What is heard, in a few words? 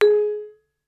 Vibraphone dampen ff Ab4 stereo; MIS Pitches - 2014; Percussion